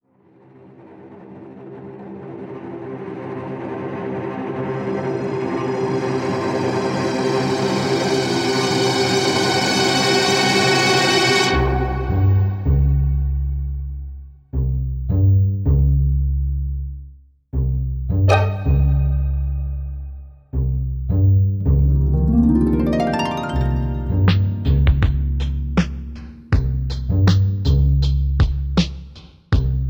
String Trip

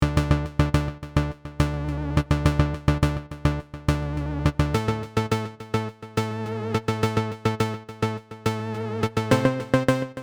Waverunner 2.0

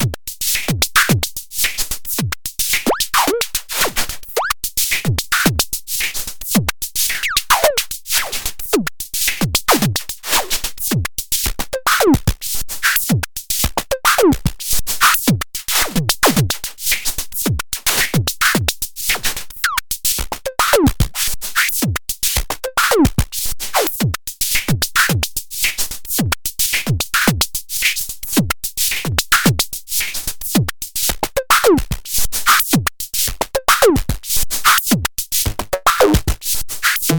Novation Circuit Mono Station Drums

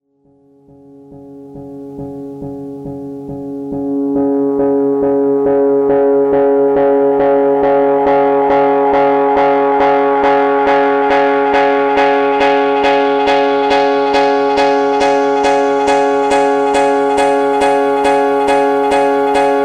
Piano

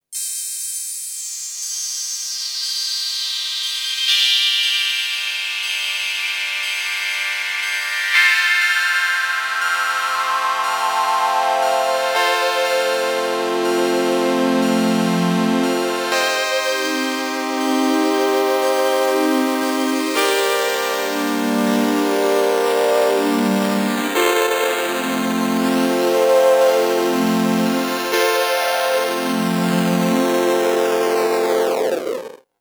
Bitrazer CT 101 HPF

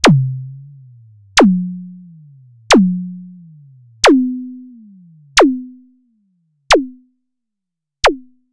ACL System 1 Filter Zaps

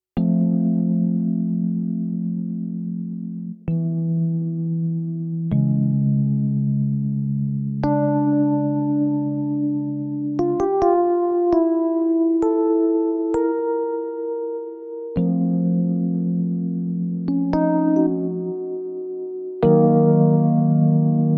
Electric E Piano

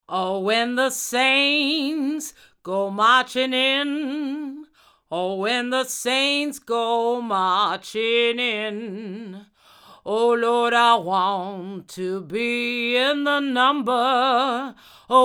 Vox saints far cardioid GA 47

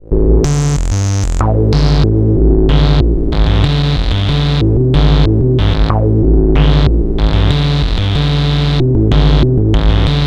Liquid Foam Loop; HerbsAndStones

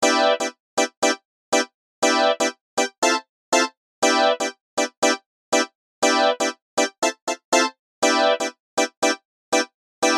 phaser

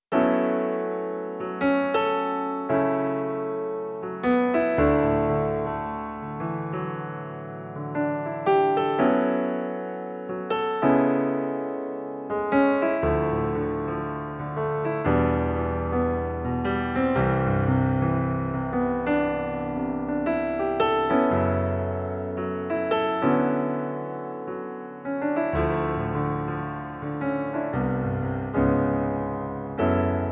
Piano

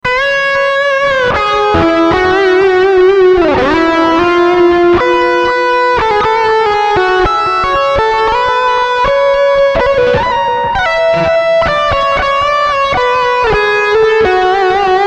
Rock Solo

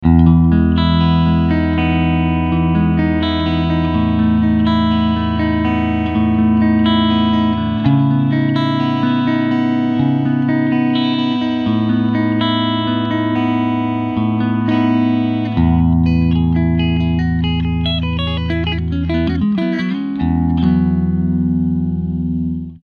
Clean SM57

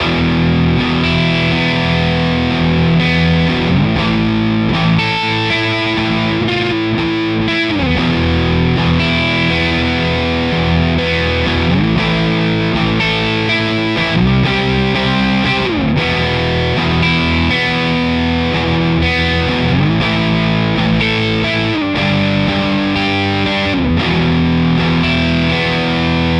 Charvel Pro Mod High Gain Both

